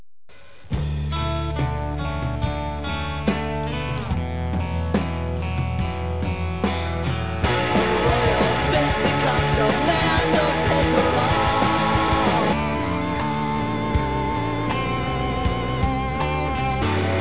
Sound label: laugh gun